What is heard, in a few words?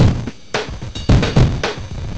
3 drums